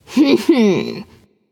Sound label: hehheh; smite; voicepack; jingwei